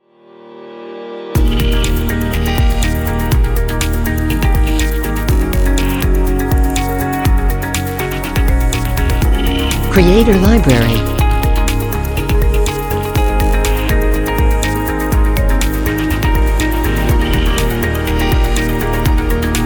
Timelapse Technology, Experimental, Abstract music